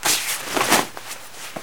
zipline clothing, combine soldier; npc; Half Life 2; Episode 1

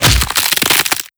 host attach gore, headcrab reviver; creatures

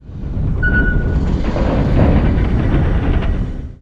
bin squeak long; ambient; Half Life 2; Episode 2; outland

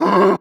warning, zombie vox; creatures

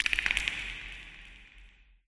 creature antlion click; creatures

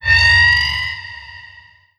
shoot warning layer, strider, combat; creatures

